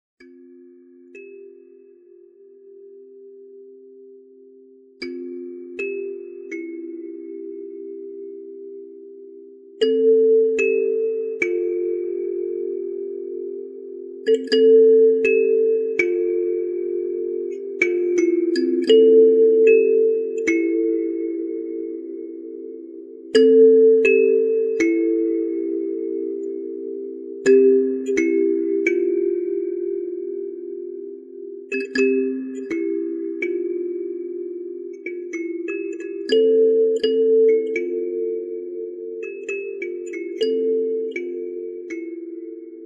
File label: kalimba